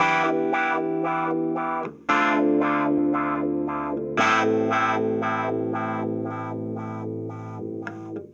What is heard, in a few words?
Cruisin' Disco Clavinet, 70s Analog Funk